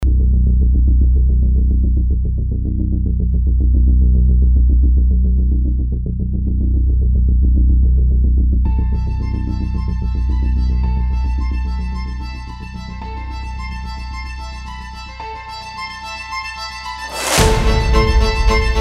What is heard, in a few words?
Breakbeat